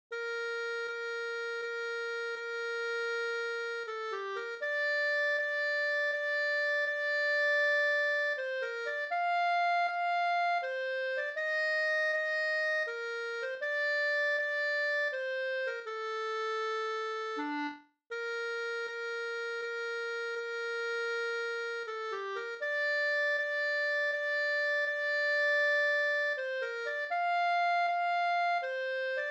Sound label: clarinet